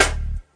snare

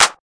Clap; Khezie Hip Hop Kit

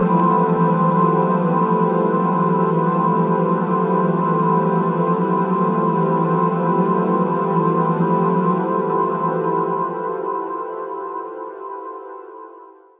complex 7th, Pads; single samples

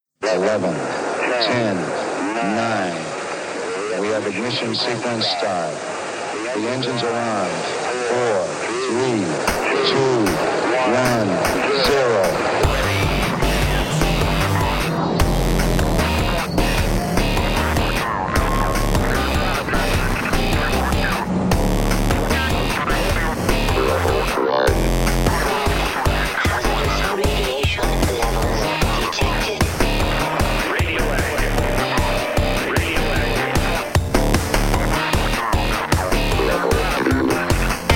8 Bits; Anonym

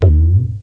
bigbend, African and Eastern Percussion

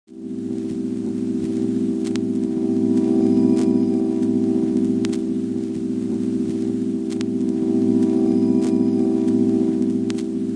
panning scratchy pad; Pads

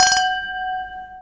glass multi tap, Crystal Glass Strikes

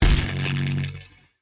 Kick Hectic, DRUMS, REAL LIVE KITS; Osama Qureshi DrumKit

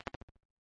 8 bit kit angry; LO FI and 8 BIT KITS; 8 Bit Videogame Kit

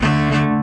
orchestra string hit D

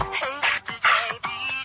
hey mister, vocal or voxy loops

